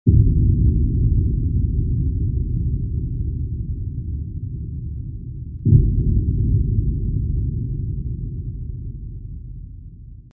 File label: distant stomp, ambience